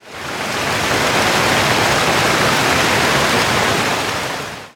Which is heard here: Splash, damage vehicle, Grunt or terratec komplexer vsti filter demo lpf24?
damage vehicle